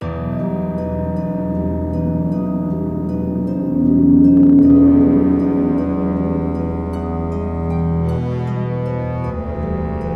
String; The Bureau XCOM Declassified

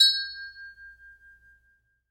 Glass Ding

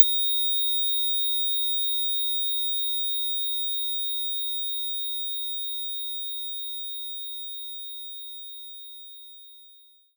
Glockenspiel D6; knights try

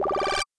Jump; Oneshot Jumperz

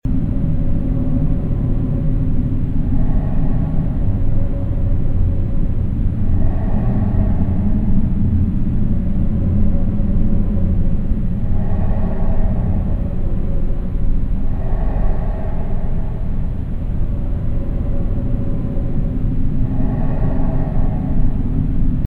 Ghost City Ambience; Ezra The Stranger; By Strayworx

